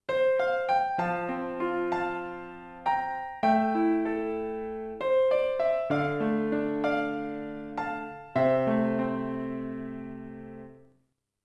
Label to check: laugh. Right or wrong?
Wrong